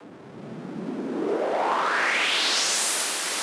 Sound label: 128BPM Noise 2Bars, Clean Noise Ramps; FX Fills; Vengeance Samples